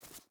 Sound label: footstep boots grass jump start; Rust